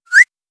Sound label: a slight quiet whine, button